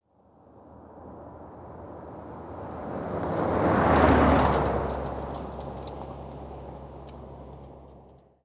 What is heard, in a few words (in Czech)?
autko kolem; Mafie 1